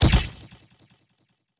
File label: ankh put on